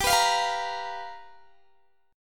G 7th Sharp 9th G, Guitar Standard; G7#9 strummed; JGuitar

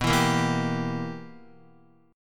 B Major 7th Suspended 4th Sharp 5th Guitar, chord; BM7sus4#5; strummed

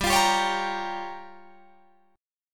G Minor 7th Flat 5th, Guitar Drop D

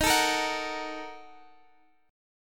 A sharp Major 7th Suspended 4th Sharp 5th, guitar

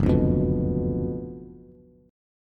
Gb Major 7th Suspended 4th Sharp 5th, Bass; GbM7sus4#5 strummed; JGuitar

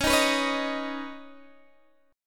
C sharp 7th Suspended 2nd, Guitar DADGAD

